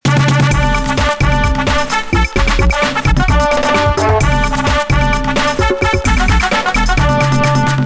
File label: Ice Cream Jingle, message tones; Pimped